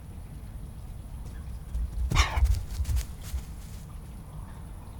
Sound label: Running Dog Passing